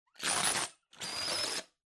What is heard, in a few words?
Blowout Triple